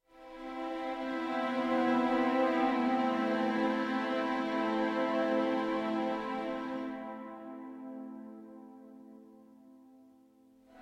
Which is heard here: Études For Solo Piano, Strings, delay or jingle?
Strings